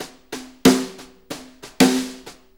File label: Brushes Loop, Full Kit Loops; Sonic Foundry (Sony Creative Software); Drum Tools